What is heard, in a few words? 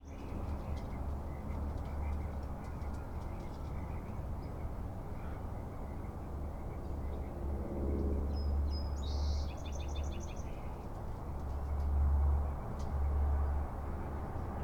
Suburban Ambience Dog Rooster Plane Car Birds Crow